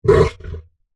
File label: Monster Bark Snarl, Horror, Monster, Bark, Snarl, Large, Creature; BlastWave